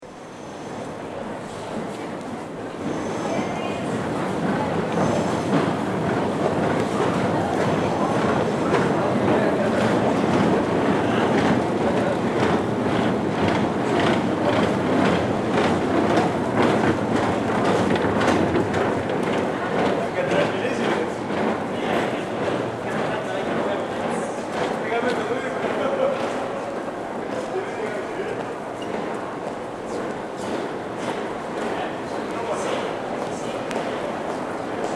Oxford Circus - Descent to platform; train departs